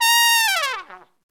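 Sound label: trumpet long fall; OMI - Universe of Sounds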